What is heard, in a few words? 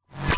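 Battery Plate Magnetic-Snap Reverse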